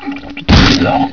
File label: vomit; vomitus